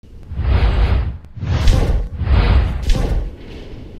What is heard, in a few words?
teleporting